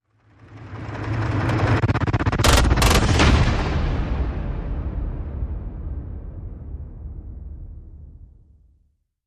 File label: Hit Complete Breakdown